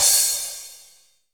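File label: cymbal, real mega drums; Best Service; Real Mega Drums VOL-1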